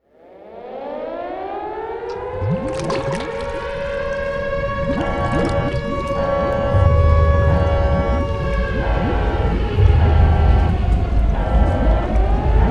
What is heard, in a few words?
ambient